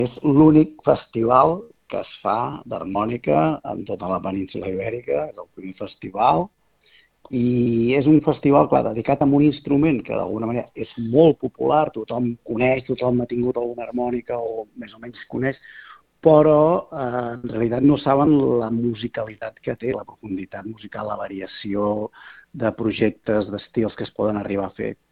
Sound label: HARMONICA; Calella